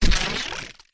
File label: science fiction impact alien body hit hard crack squelch bloody